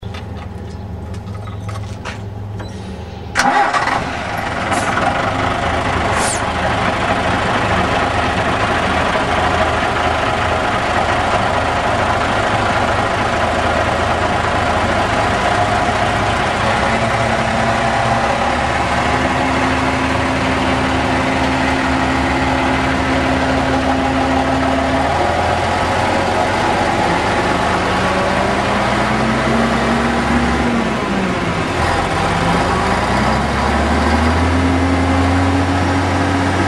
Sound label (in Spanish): sonido pegaso troner 370cv